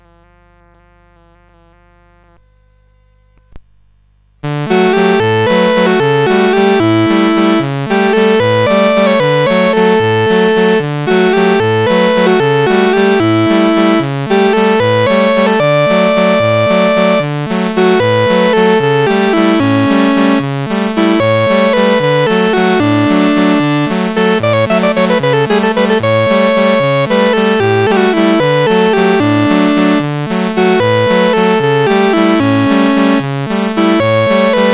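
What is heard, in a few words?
Italian Folk Song, MOS CSG8580R5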